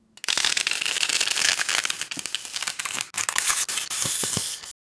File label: crayons drawing on paper